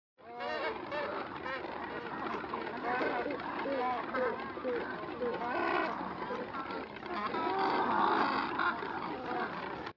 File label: Double crested Cormorant